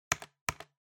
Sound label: Trackpad Click L Hard Double